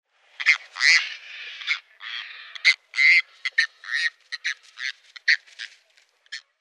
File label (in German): Afrikanischer Schlangenhalsvogel Anhinga rufa; Vogelstimmen